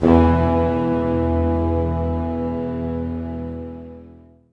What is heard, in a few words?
SNAR, Snare Army; East West - Scoring Tools